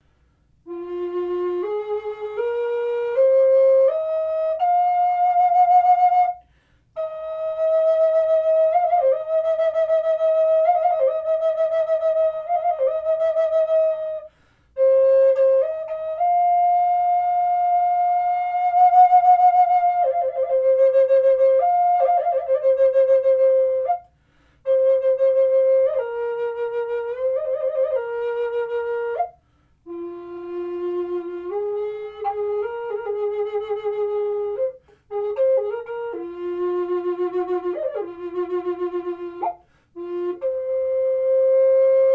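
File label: natural, flute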